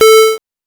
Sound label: PO Synth A; SYNTHS; CHIPSHOP DELUXE; CHIPSHOP HITS